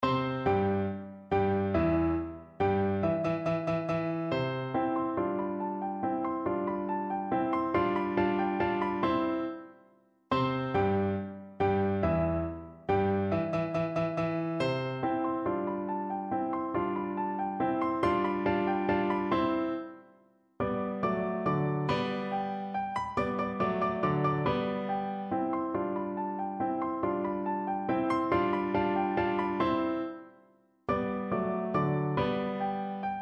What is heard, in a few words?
German Dance PNO, piano